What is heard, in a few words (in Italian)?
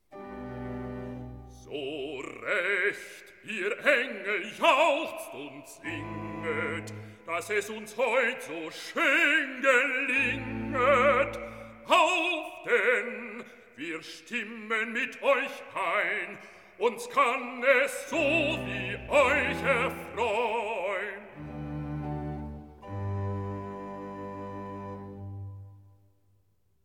Recitativo; Bach Johann Sebastian; BWV0248; Weihnachtsoratorium; So recht, ihr Engel, jauchzt und singet